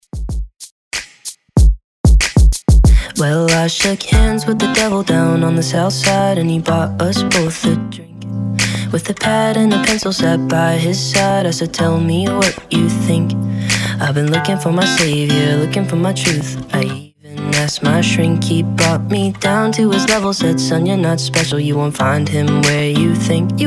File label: Top40 Redrum 94bpm